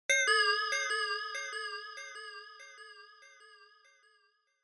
Inspiration Low Delay; Onoma

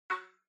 OS Sound (Speaker-Notification)

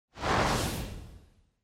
Camera Motion Dry; シーン・カメラモーション

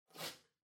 Cardboard Box Far Label Peel Off; ダンボール; 遠い ラベル 剥がす; 梱包材

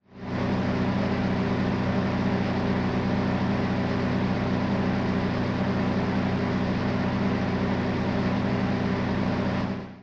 SNES Racing Starting Grid

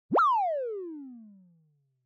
Motion Slide Low

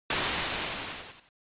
NES General Noise Single

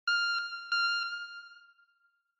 Retro Anime System Short

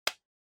Hand Foley Clap Mid

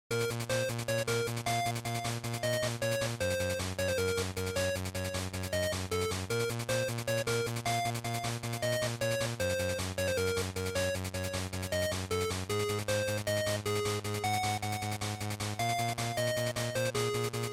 GB Shooter Stage

